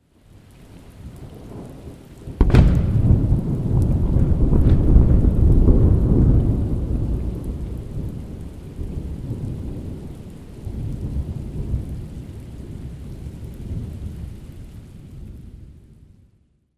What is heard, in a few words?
thunderclap